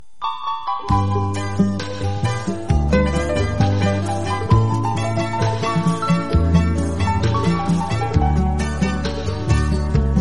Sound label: java instrumentl